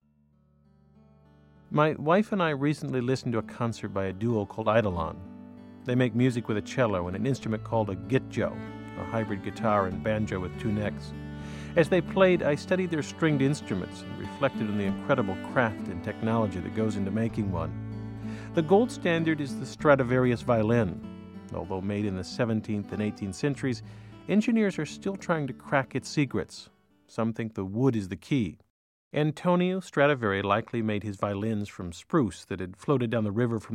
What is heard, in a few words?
violin